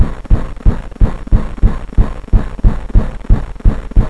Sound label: fire